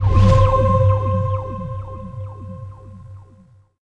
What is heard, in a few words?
Rescue Portal Open